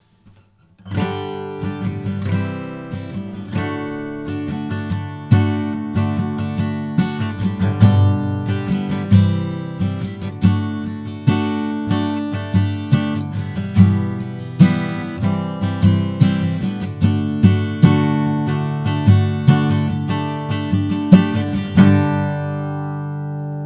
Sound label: Acoustic steel string guitar